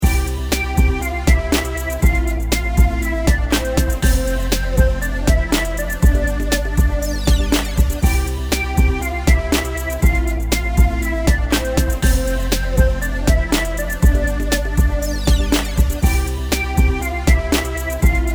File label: instrumental